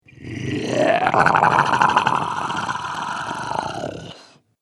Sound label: Zombie Gurgle Growl; Zomby Sound Effects; horror